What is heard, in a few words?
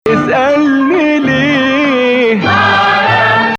Nawa Bayati